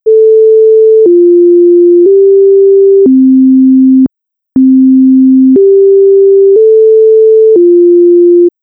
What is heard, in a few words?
Westminster Chime, outdoor warning; emergency; FWS-4026